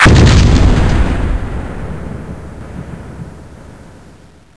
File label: explode; svencoop